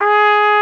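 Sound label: Big Band Fast, trumpet; Roland L-CDC-01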